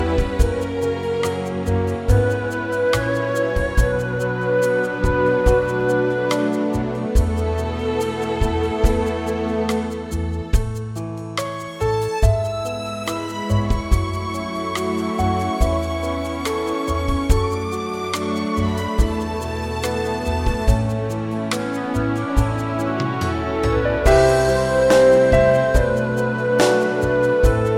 A orchestra

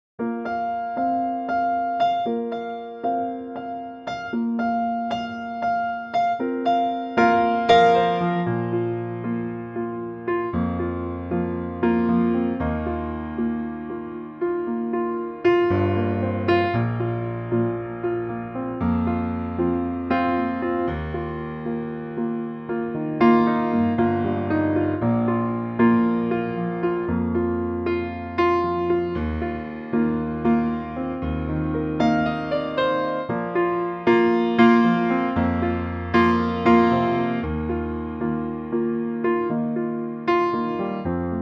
Bb piano

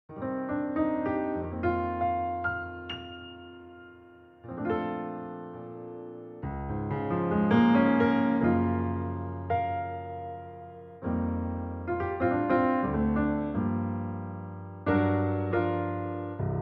Bb to B piano

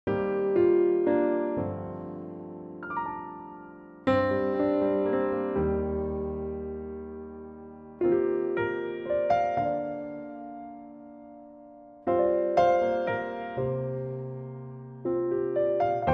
Bb piano

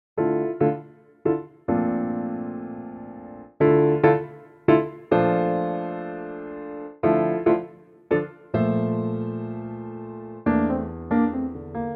F piano

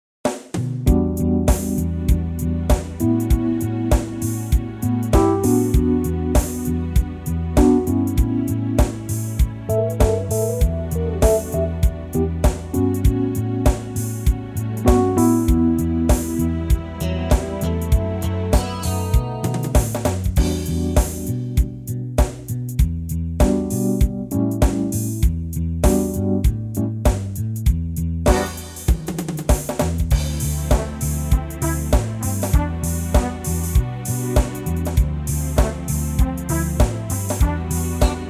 Em

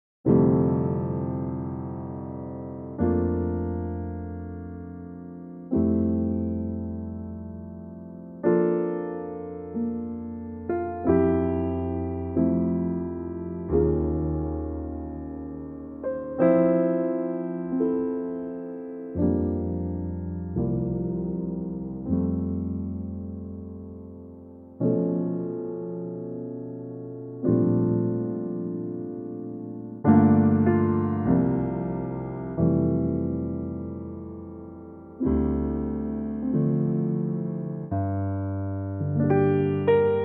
F piano trak

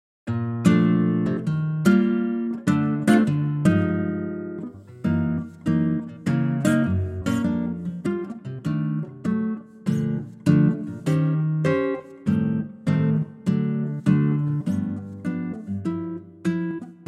Bb guitar

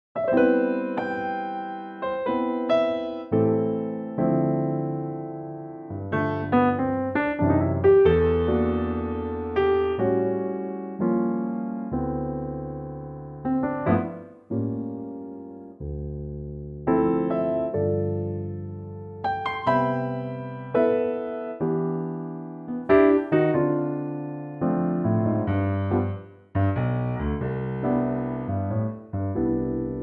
piano

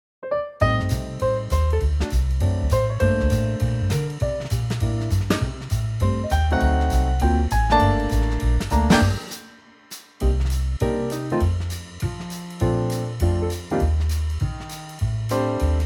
Gm jazz

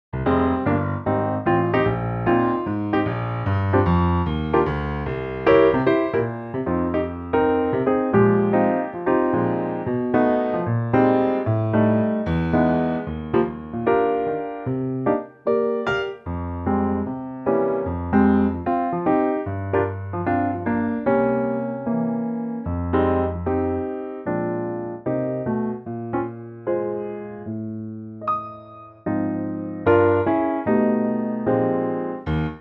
Eb piano

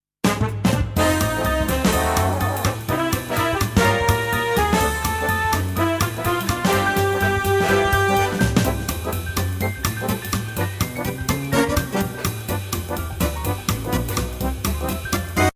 C swing

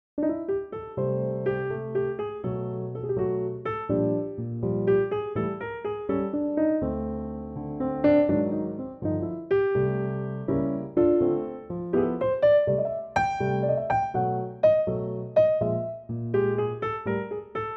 piano

